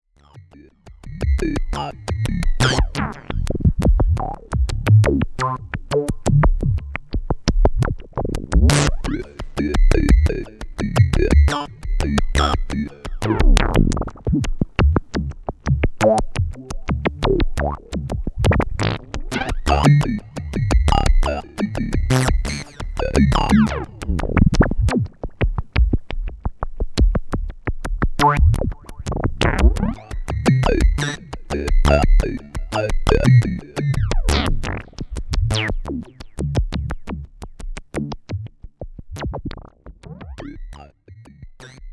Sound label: obx voice solo fx